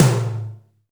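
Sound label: tom drum, left; Best Service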